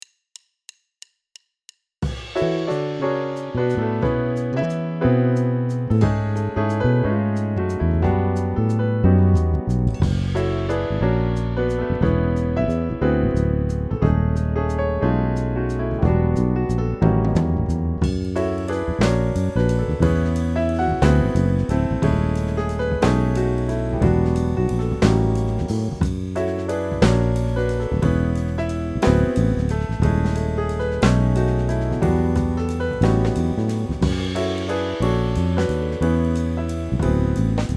Jazz

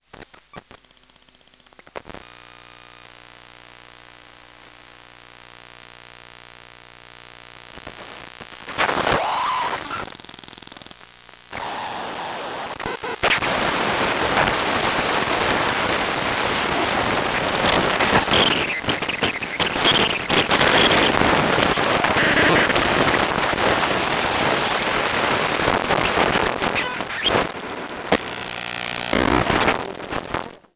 iPhone 5s booting EM; EM Pickup

